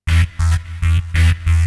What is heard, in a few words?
ZIPPER, KEYBOARD, HOUS TEC; MAGIX